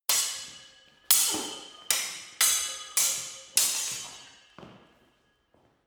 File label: sword hit sword sequence